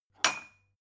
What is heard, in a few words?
glass take